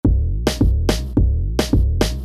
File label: Reggaeton